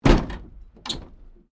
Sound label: WRECK DOOR PANEL SLAM, Wrecked Cars; impacts, crashes, scrapes; Crashes (Sound Effects:Objects); SoundEffects-Foundation4